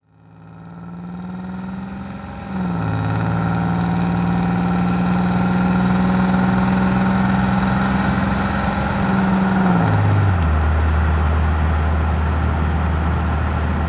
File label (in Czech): nakladak; Mafie 1